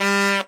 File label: horn